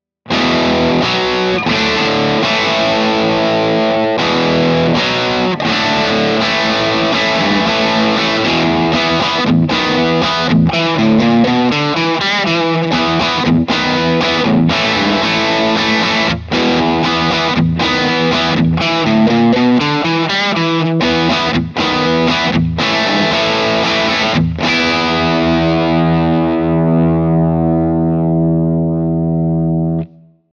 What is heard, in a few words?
rock chords; jensentone